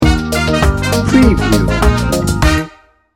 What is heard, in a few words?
tropical sunshine sting bumper